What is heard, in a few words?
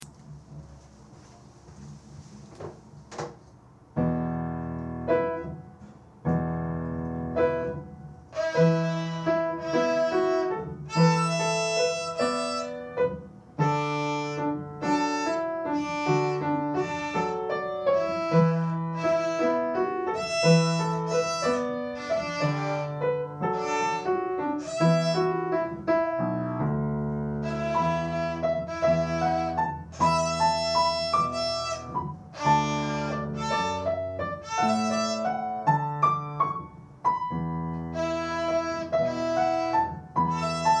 jig, Violin; peeler's jig